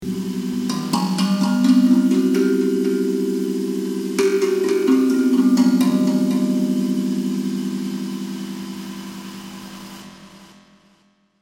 hapi drum akebono e scale with celestial spring filter effect